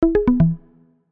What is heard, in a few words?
exercise complete